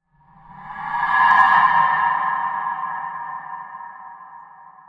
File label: horror sfx